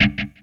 Menu Select

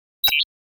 computer bleep; NCH Library

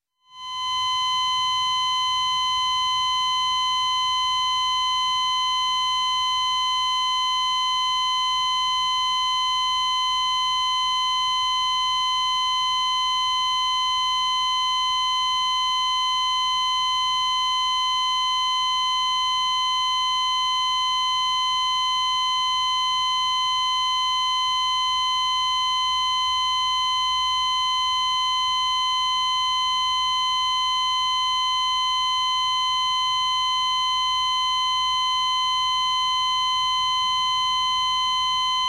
Hospital Heart Monitor Monitoring Constant Beep; Sound Ideas 2000 Series

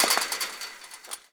fence; firefight

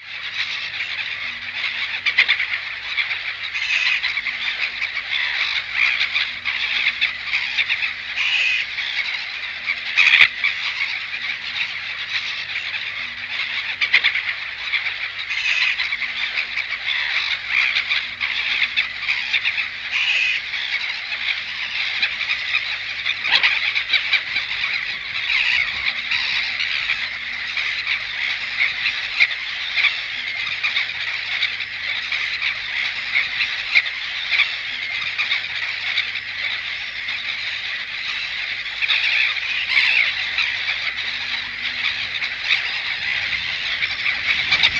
turtle gull cluster; best service Blue Box

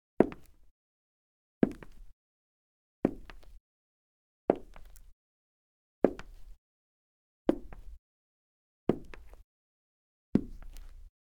Wood Parquet, Foley Footsteps; Sound Ideas

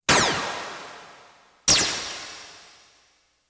Science Fiction effects, Lasers, Light beams

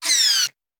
Bullet Pass By; Sound Ideas; Guns

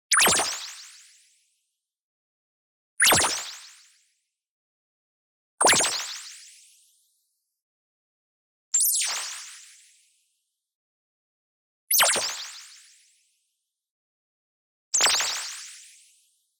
ZAP Ping Rise; boom library; Anime Essentials; DSGNMisc

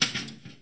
Pinball Eject Ball

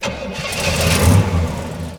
Truck 4x4 Start; Trucks

